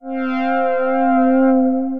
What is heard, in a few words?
Simple Analog Pad; Ethereal Pads; Ableton; Mood Reel